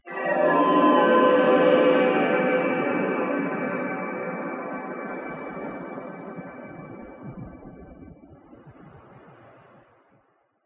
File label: PlayStation 2 - Back to Menu